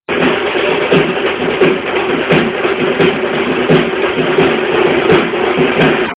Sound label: Beat And Beat